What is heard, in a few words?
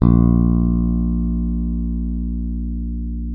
A#1, FINGER MUTE; Xsample VOL-1